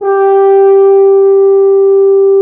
Orchestral Brass

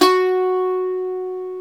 SAZ, Plucks; Eth Plucks, Pluck Duo

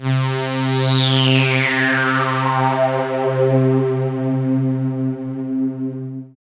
SYN ONE, Roland; Sound & Vision - Gigapack I CD 2

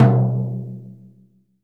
P.MTOM P, VELOCITY TOM; VELOCITY TOM 2

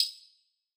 Percussion; Zero-G; Nu Disco

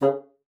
bassoon, C#3; Xsample VOL-6

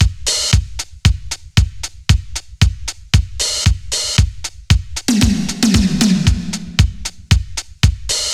115 Beat Lost; Beats; Zero-G; Future Wave

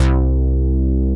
BIG BASS; Trance; AMG - Global Trance Mission VOL-2; SYNTH

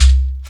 BASS, Percussion, FUNK; InVision Interactive; Percussion Slam

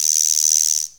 CR 78 KIT

